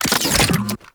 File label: Briefcase Launcher Unequip; Warframe; Weapons; Corpus